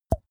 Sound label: snap cheek single; koerpergeraeusche; menschen; soundpool